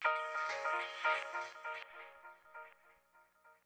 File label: Stab; Thirty-One Flavors